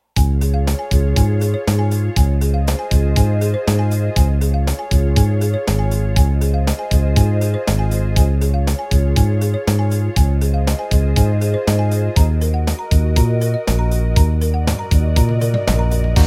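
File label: 8 beat analog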